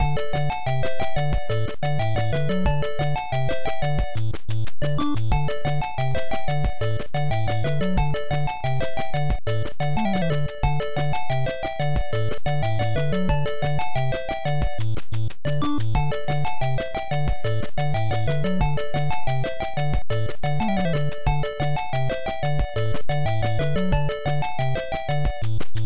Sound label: Garage BGM; Konami Wai Wai World; Family Computer